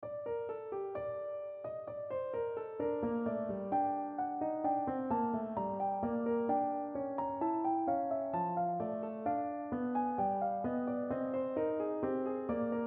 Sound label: two part g minor